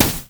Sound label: human basic attack; Elise